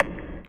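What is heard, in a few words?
hit with echo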